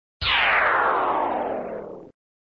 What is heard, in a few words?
Sound SFX, flipper; sttng